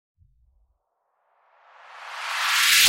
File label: Awesome Riser